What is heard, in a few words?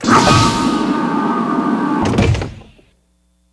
shuttle door open; trek